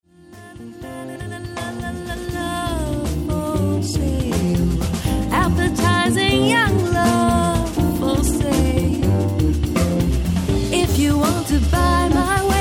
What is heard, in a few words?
solo